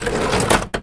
closing cash register

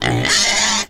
pig oinking

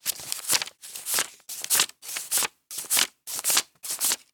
counting bills

